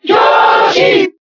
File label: Cheer Spanish; Yoshi; SSBB